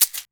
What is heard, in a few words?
Household Shaker, household rhythm samples Hits